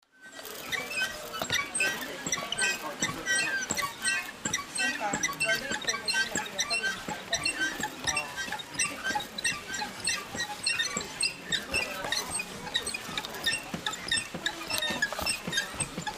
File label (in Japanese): uguisu; rohm saijiki; nijoujou